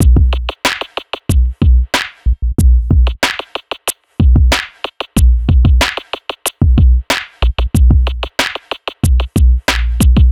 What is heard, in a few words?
Hip Hop Beat, Drums